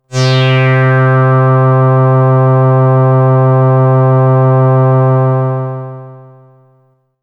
Sun Sigh D, synthpad

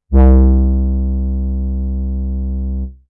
Basic Pulse, synthlead

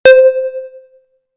Bell C; instruments

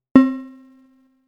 Bell C, synthlead

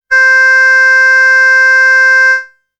Oboe B

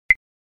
Claves; drums

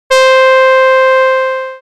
Dream Brass; instruments

